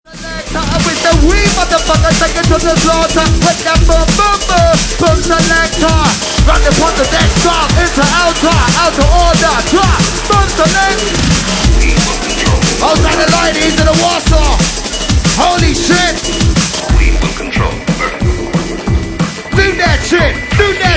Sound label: DNB